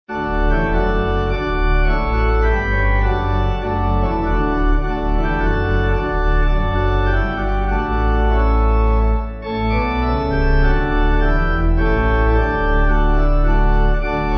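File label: Pipe, Pipe Organ backing; Awake My Soul; Christmas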